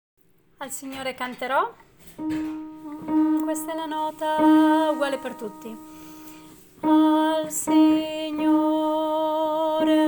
contralti; al Signore cantero

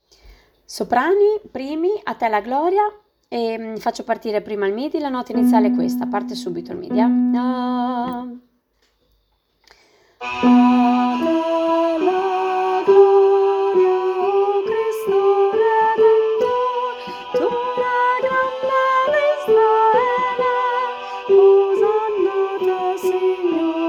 soprano

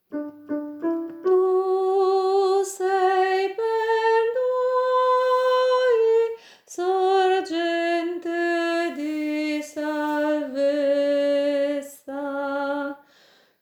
Soprani

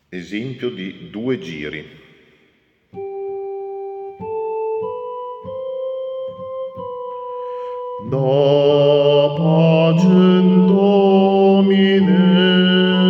bassi; Da pacem domine

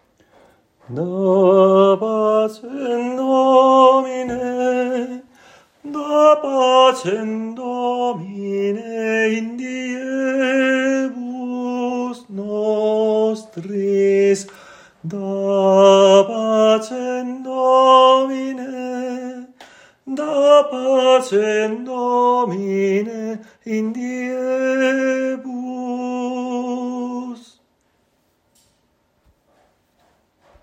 tenore; Da pacem domine

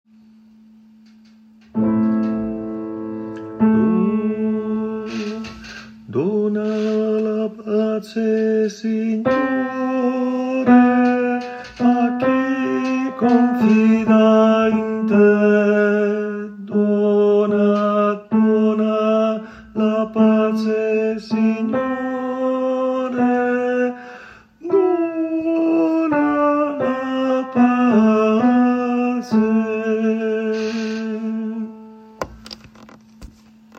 tenore; Dona la pace

